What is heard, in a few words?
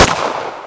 LUGER P08